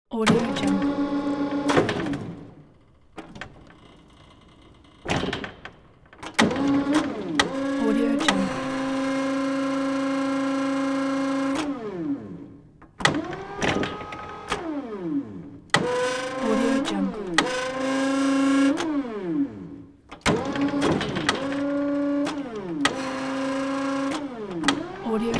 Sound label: mechanical activity